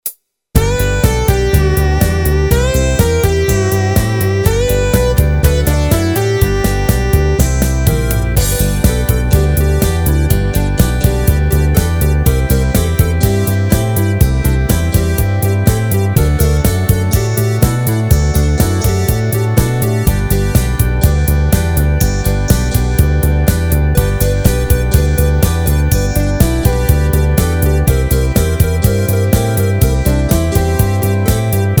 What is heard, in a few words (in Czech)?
K mikrofonu čelem, MIDI